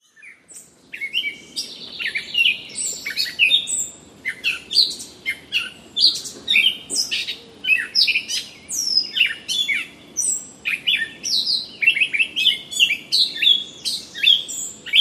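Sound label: Catbird Frog House Wren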